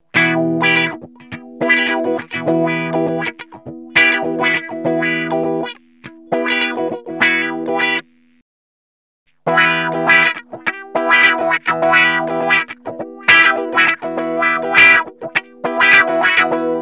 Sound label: wah, Tri-Wah